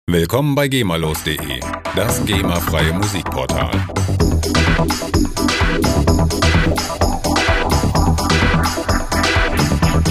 trance stack

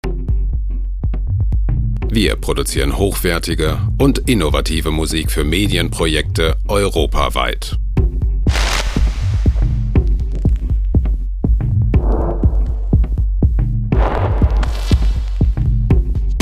rhythm texture

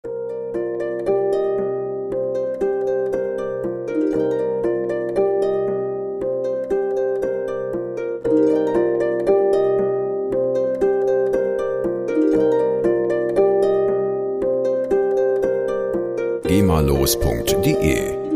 harp

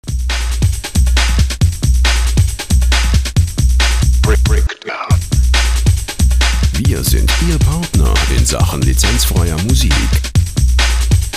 drumloop

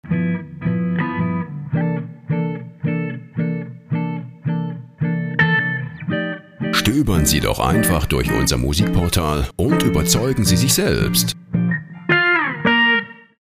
jazz guitar

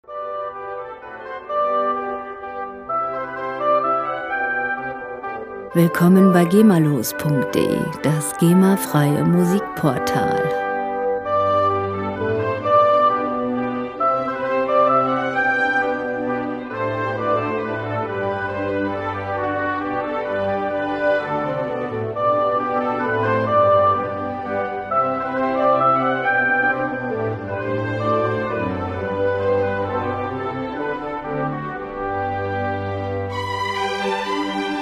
bach minuet in g major

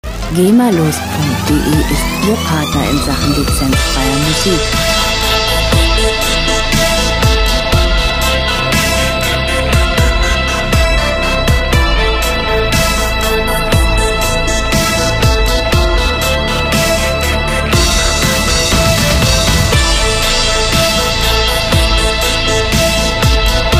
gater

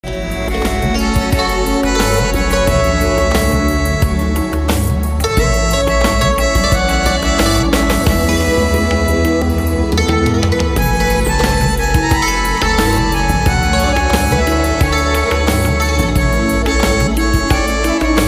tabla tarang

